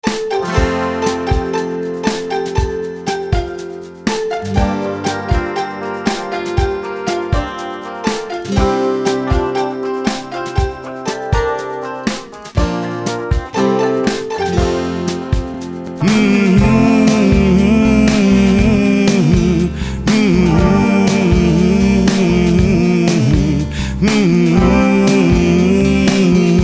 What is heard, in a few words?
sing a song instrumental